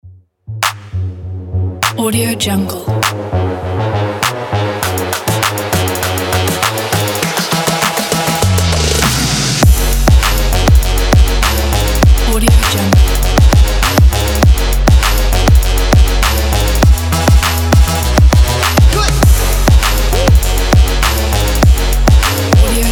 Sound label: trap